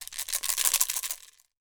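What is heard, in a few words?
COW RATTLE, PERC; AKAI S6000 CD-ROM - WorldSounds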